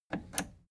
plastic button scratch click disable slow

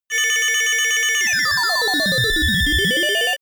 futuristic computer

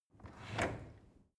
drawer cutlery open draw short

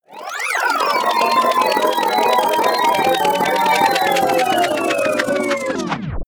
swoosh

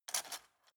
cutlery plastic drawer grab

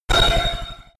sci-fi laser shot hard

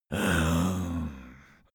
zombie moan idle

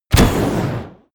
bow shot fire

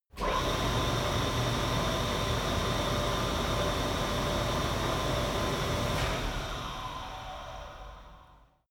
flue gas installation start running stop

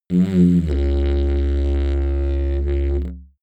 didgeridoo short tone

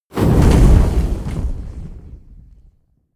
burst

